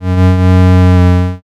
creak

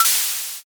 can open

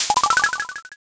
board flip

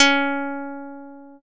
guitar

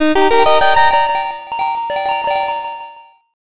jackpot

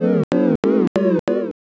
laugh crowd

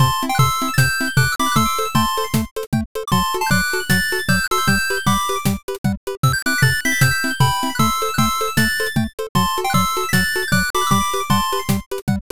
bgm bright

